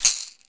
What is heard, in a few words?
BASKET, Afro Shakers, Shake&Rattle; Roland LCDP14 Africa VOL-2